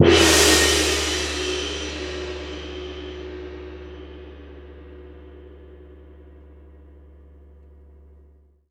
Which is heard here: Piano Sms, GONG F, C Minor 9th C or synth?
GONG F